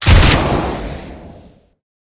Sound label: rocket jumper shoot, weapons; tf